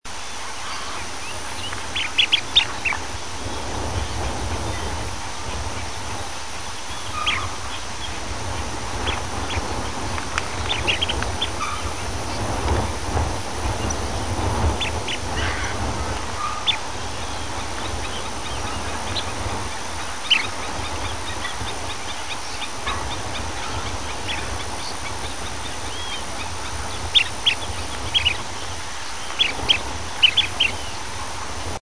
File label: collared plover